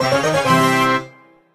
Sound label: mvp jingle; Brawl Stars